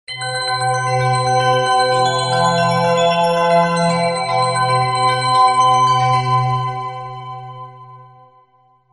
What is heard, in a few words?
amazing ringtone